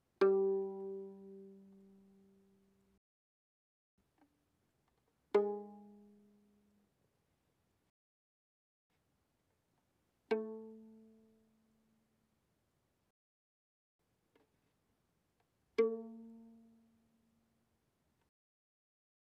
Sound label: Violin pizz mf sul G G3 B3